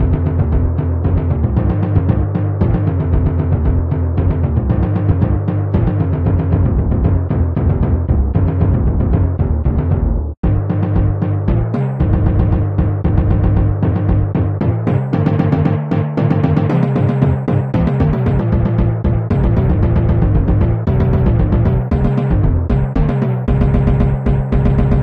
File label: Drum